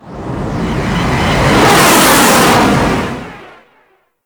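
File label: Stanchion Ship Rocket Projectile Whizz By; Warframe; RailJack; Proc Ships; Corpus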